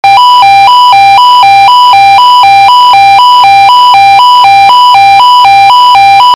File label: tone